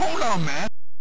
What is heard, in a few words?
Title AY Beeper; Chase HQ